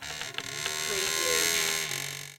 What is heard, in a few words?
Creak Short